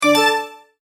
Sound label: multimedia tone start; Sound Design